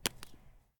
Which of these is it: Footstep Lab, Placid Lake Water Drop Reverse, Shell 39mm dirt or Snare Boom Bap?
Shell 39mm dirt